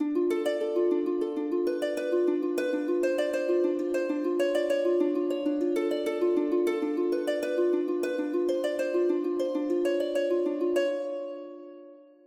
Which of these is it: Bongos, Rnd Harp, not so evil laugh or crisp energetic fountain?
Rnd Harp